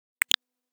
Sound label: happy to help, notification sound; application user interface ui sounds